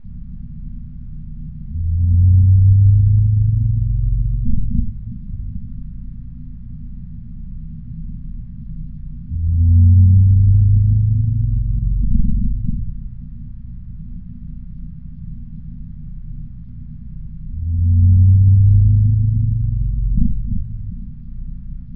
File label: male